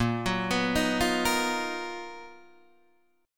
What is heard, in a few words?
B Major Sharp 11th A sharp